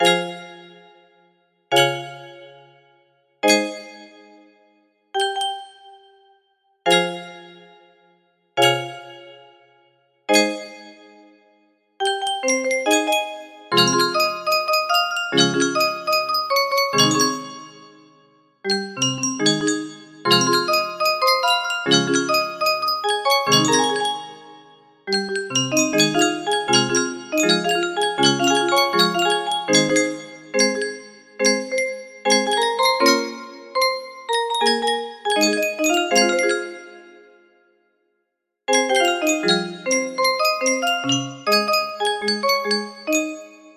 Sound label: musicboxified